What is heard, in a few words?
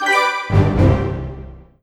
Jingle Stage Fail